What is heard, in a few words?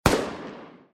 shot